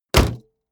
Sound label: car bmw e46 exterior bonnet close